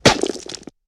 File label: Limb and Flesh rip and chewing; RadwinIsland